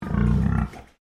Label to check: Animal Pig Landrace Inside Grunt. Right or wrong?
Right